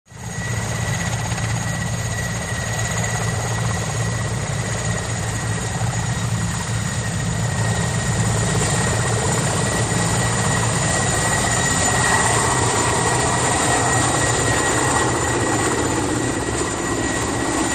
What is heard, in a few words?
Helicopter Hover